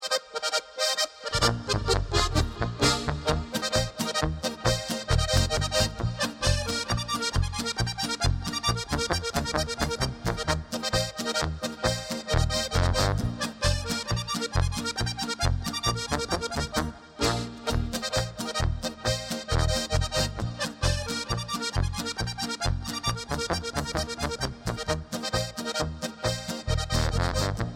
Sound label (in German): Polka